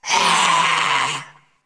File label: gorefast idle alerted; kf2; gorefiend; slender